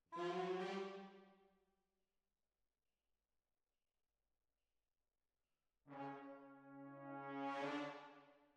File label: Hall